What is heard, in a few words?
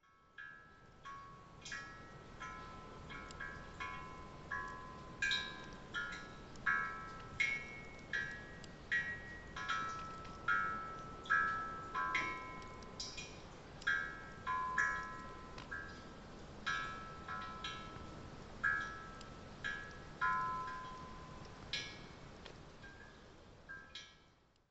suikinkutsu